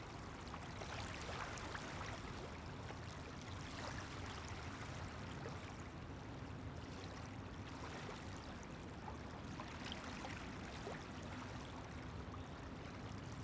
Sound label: River Small Waves